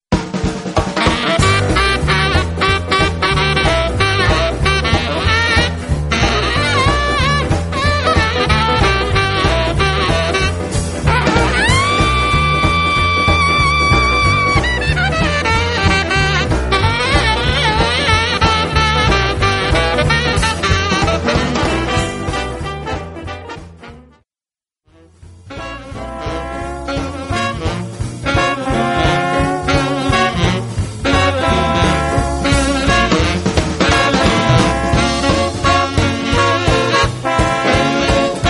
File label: Swing